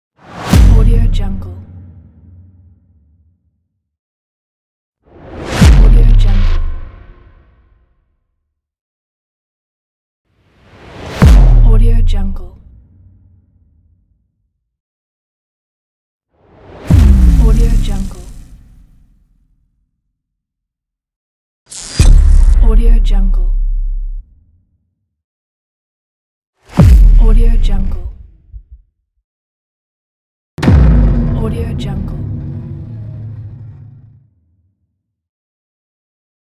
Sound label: boom